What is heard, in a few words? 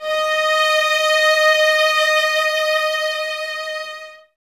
violas D#5, String Ensembles; Miroslav Vitous; VLS 1+2